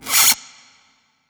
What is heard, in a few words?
Scratch Wet; Effects